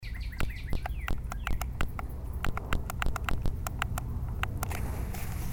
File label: Wood clicks